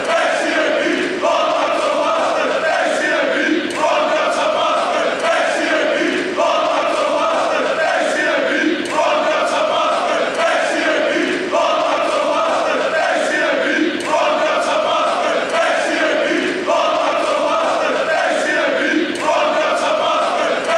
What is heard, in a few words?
acab, fanchants